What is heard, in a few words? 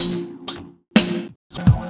Break, Drum N Bass Percussion